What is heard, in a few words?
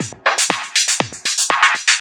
Filtered Beat, Trance